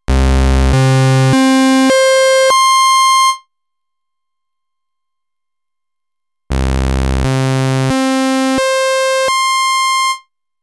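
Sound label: waveform, novation bass station rack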